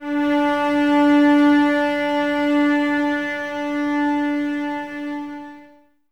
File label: Cellos No Vib D 4, String Ensembles; Miroslav Vitous